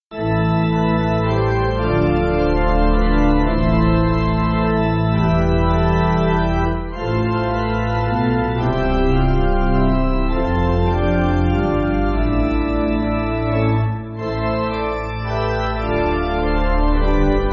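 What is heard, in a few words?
Pipe Organ, Backing Files for Churches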